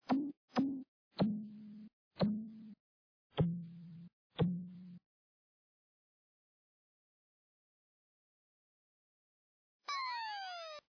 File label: Water Bass; Jupi-8